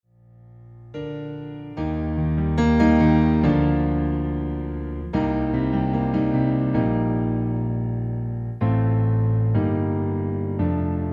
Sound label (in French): instrumental